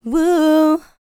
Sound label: LICKS, Vocal Planet, R&B; ILIO; Dance; LT R+B